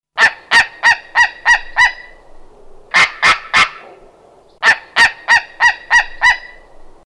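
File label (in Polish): bielik, głosy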